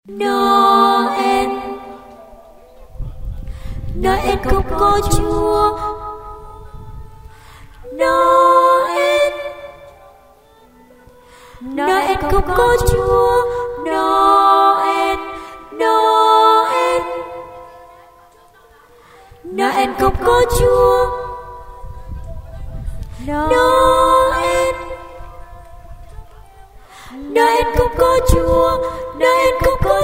basso tenor

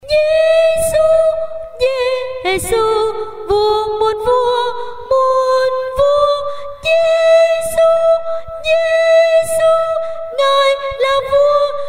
tenor

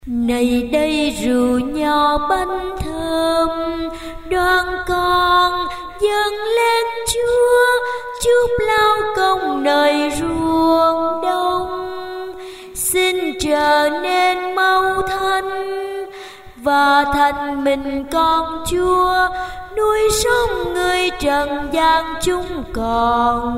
basso

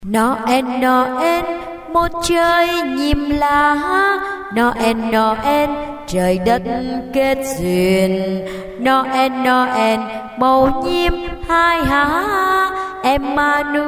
basso

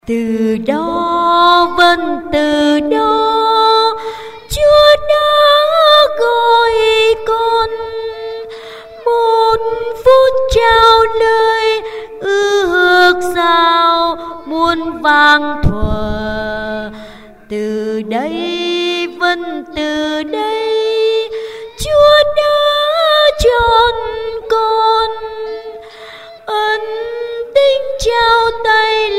basso

